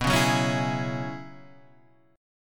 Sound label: Gb 9th Suspended 4th B, chord